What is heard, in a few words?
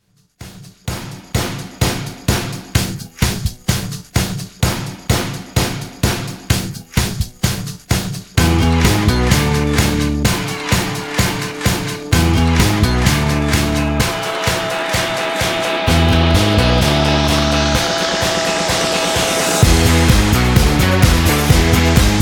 Re drum